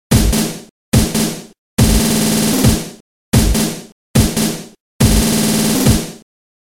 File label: Electric Drumming